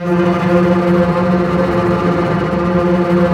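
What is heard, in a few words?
double bass tremolo; String Master Series; Cbs FX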